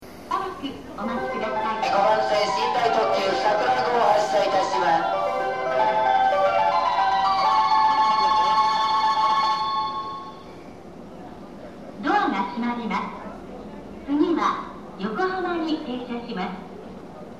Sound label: atami bell; jreast; tokaido